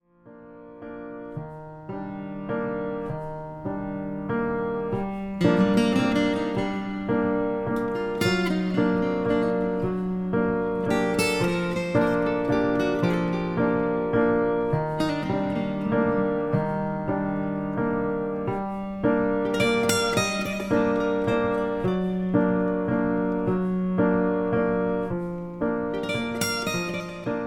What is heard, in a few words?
Dove Waltz